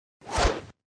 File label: slide; The Witcher Hunt